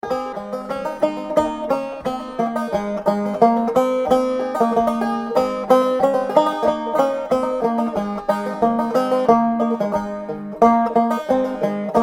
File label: Banjo